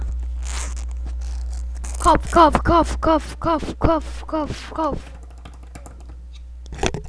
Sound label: cough